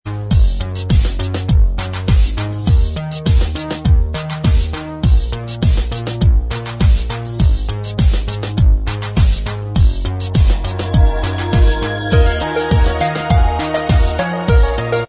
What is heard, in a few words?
Inst Track